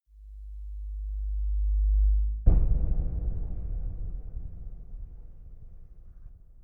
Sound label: low hit